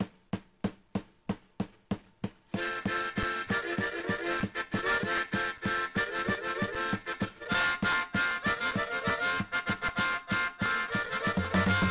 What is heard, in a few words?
music; flipnote